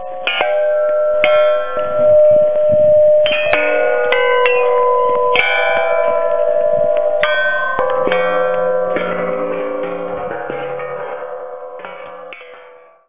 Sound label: sculptures banging; Marree